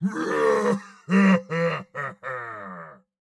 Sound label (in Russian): Смех; Турбосвин